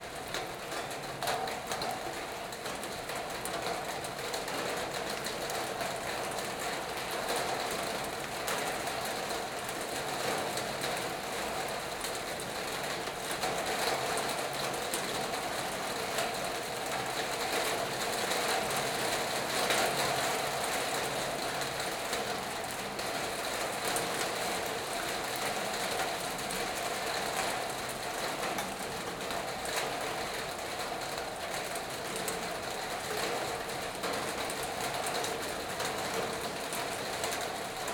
Rain, Interior, Garage, Rainfall, Pour, Metal roof; toneglowlibraries